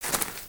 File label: Footstep; Lego; Strombie; creatures organic